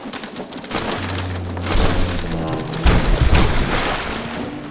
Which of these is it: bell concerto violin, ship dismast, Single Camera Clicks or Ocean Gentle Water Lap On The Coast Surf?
ship dismast